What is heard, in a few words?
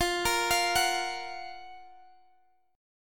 Gb Major 7th F, guitar